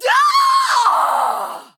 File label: Female Harpy Death